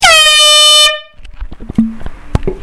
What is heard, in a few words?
jet horn